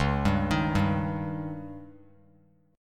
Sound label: Db Suspended 2nd Flat 5th, Bass Standard; arpeggiated; JGuitar